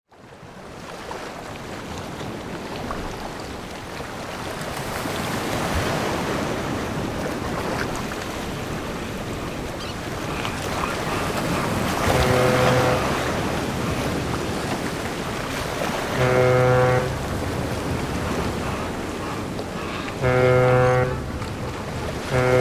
Seaside; Blackberry Mobile Ringstone

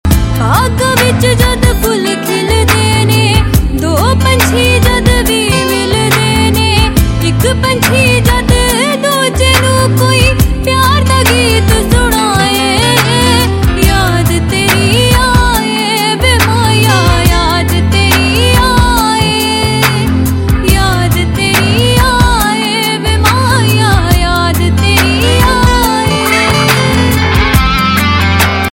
Punjabi New Sad Tones

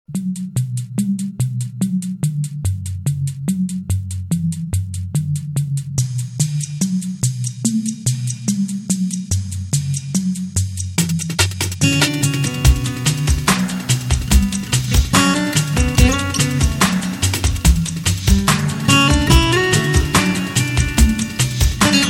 Ambient Chillout Ringtones

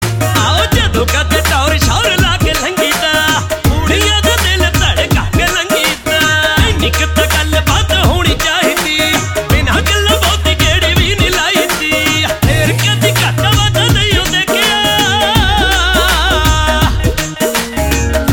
Punjabi Bhangra Tones

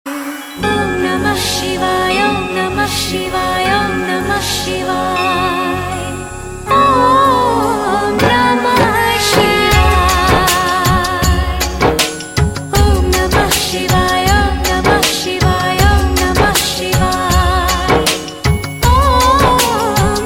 om namah shivay; Navratri Special

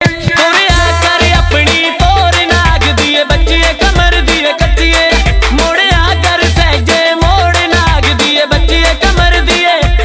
Punjabi Bhangra Tones

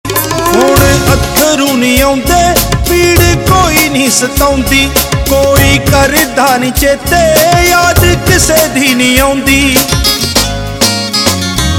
Punjabi New Sad Tones

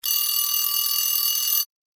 alarm clock, alarms; OnePlus 5T Stock Ringtones

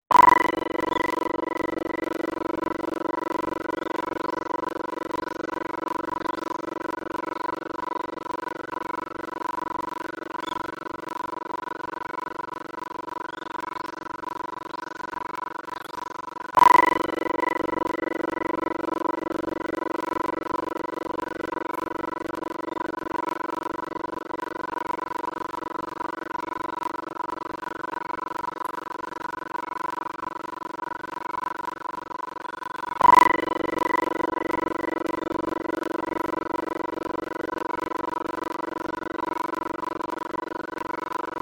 Tokyo Morning; Blackberry Mobile Ringstone

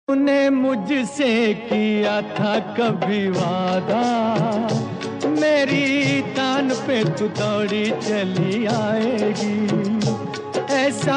Hindi old Sad Ringtones; Hindi Movie Ringtones

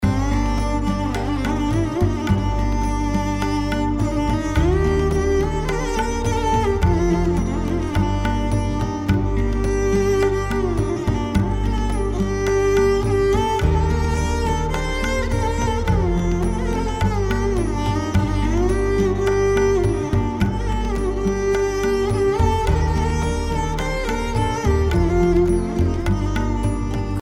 Instrumental, Gurbani Ringtones

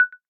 audio end, ui; Android P Ringtones

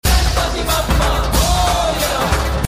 Ganpati Bappa Morya SMS Ringtone; Lord Ganesha Ringtones; Devotional Ringtones

